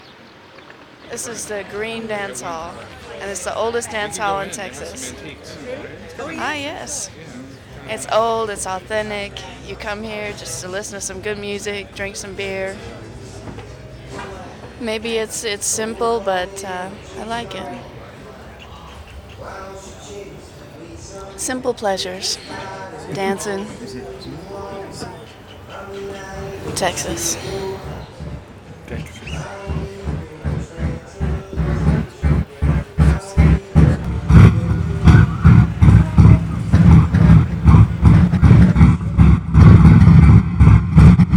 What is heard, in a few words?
Harley Davidson; Les Anecdotiques